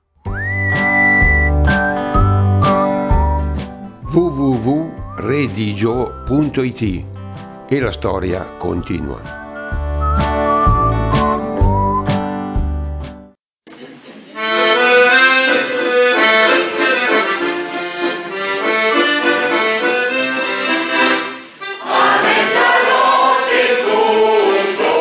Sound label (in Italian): canto